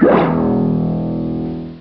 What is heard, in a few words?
Wham Sound; DVideoIII-Demo1